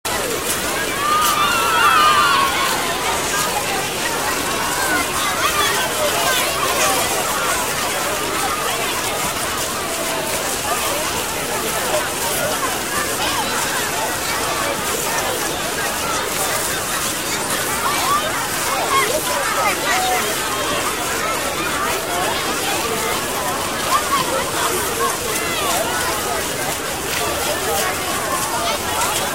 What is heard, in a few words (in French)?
Bruit Enfant Parc